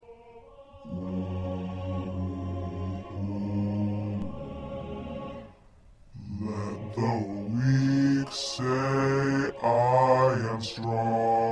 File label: Bass, choir parts